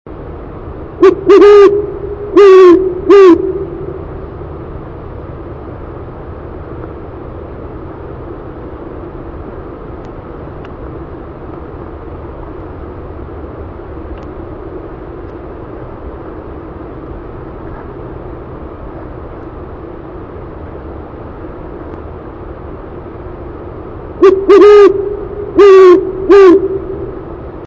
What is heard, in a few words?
owl great horned hoots; birdsongs